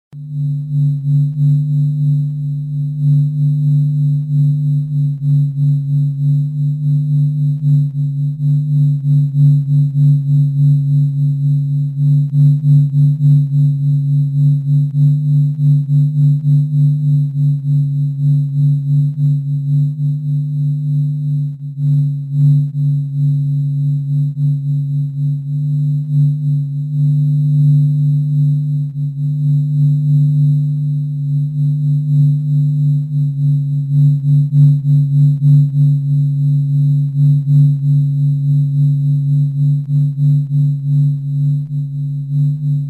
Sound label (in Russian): zvuk vibracyi solnca